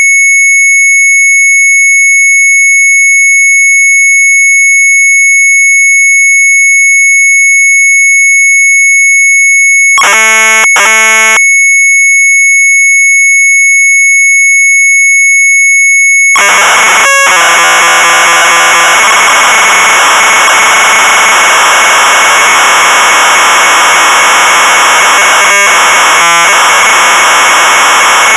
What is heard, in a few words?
Sharp MZ800 Tape; MIDAS; retromelsarchive